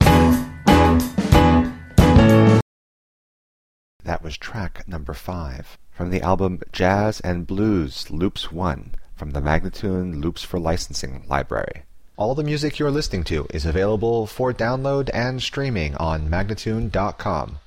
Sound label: E, spoken, Jazz and Blues Loops; Loops For Licensing; MagnaLoops